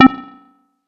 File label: error